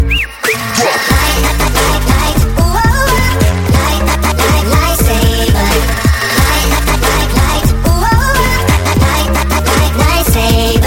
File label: Lightsaber; Nighcore